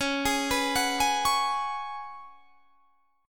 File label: C sharp 7th Suspended 4th C sharp; C#7sus4; arpeggiated